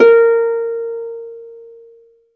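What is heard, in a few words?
HARP C5 SUS, Strings; ILIO; Synclavier Strings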